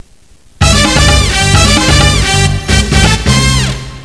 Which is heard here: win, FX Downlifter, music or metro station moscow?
music